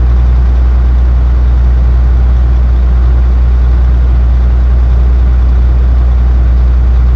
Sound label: DC 13 ext 1200, volvo 9900; Vehicles; AI volvo 9900; Freyfurt Beta